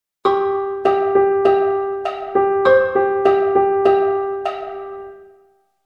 melodischer akzent; Songwriting Grundlagen

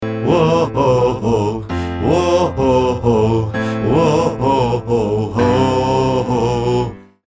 mit formanten; Verdopple die aktuelle Stimme

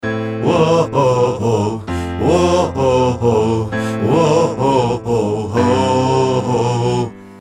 chor abgemischt; Verdopple die aktuelle Stimme

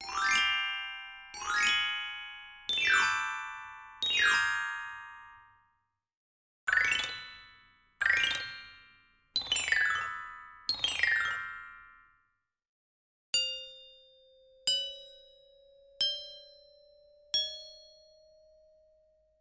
keyboard zonen; best service ethno world 5

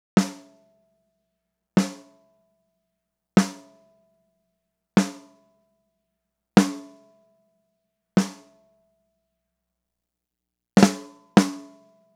snare drum kein fet compressor

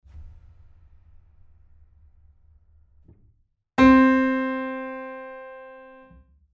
boesendorfer 290 fx

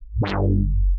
huellkurve filter; Die Hüllkurve erklärt

